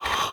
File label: breath fast in; reviver